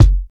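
clear kick sound f sharp key, steel kick drum tuned to the F# note; kick drum samples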